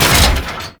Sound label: Gatling Gun Dry Fire; Warframe; Tau Gatling Gun